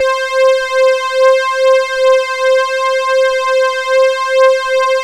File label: poly synth; Sound Gallery - Charged; POLY SYNTH 1